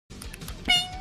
Ping

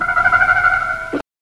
phone ring; Ultraman

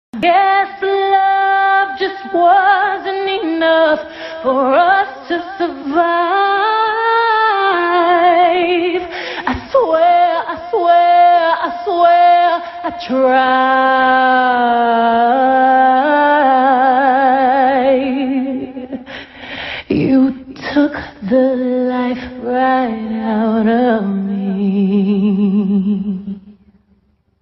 Sad Song Heartbeat

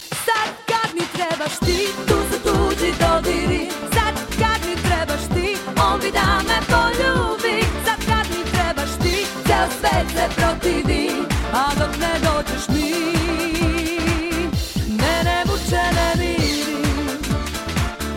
Funky

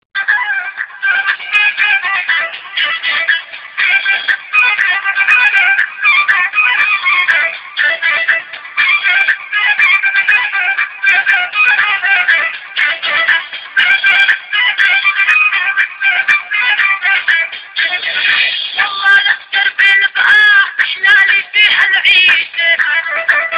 Dabke

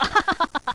laugh

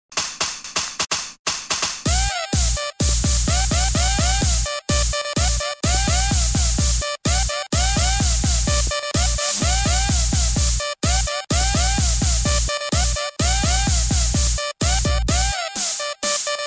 Buggy Bass

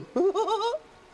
Giggle

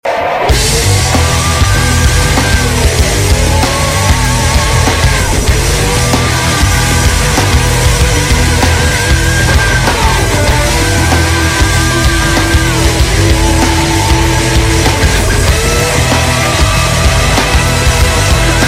Rock gospel; solo

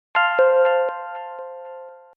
Electric Bounce

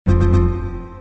Battery Low

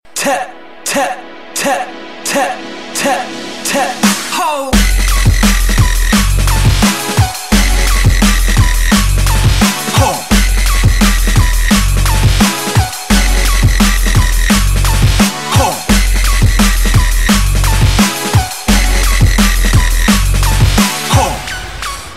Dubstep

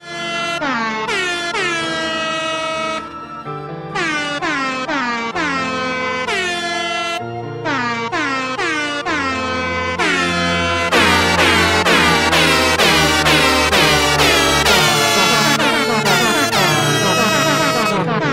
Airhorn; Roxas

